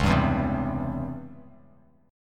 C sharp Minor Major 7th Flat 5th Bass Standard; C#mM7b5 strummed; JGuitar

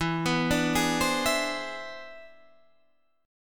E 7th Flat 13th; E7b13 arpeggiated; JGuitar

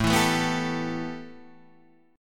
A 7th Suspended 2nd, A, down

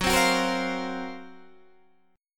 Gb Major 7th Flat 5th Guitar Open D

